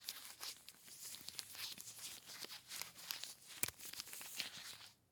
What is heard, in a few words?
Latex Glove; Blastwave